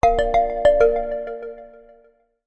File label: draw